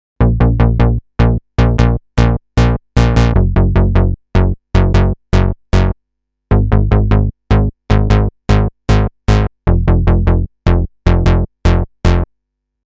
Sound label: moog source